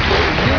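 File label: no deal whoosh; usa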